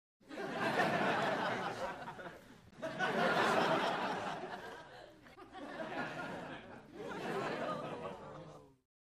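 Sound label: Crowd 100 Short Light Burst; medium int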